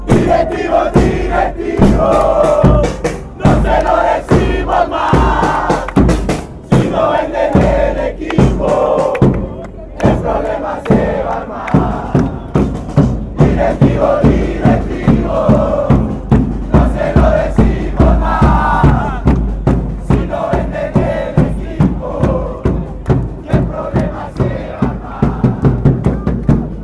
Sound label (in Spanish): medellin fanchants